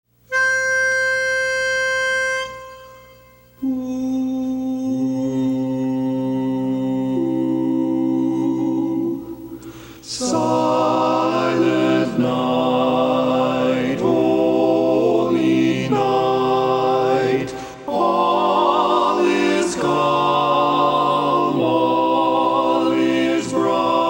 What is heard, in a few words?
tenor; silent night